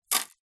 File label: household jewelry bracelet metal down on wood; zapsplat